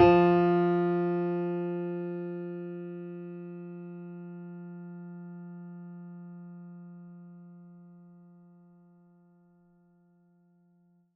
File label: mini grand; bananenkeyboard